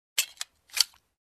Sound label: Ejecting Pistol Magazine